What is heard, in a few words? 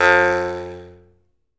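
Jaw Harps, Pitched Percussion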